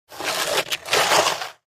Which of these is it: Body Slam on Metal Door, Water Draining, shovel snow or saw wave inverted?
shovel snow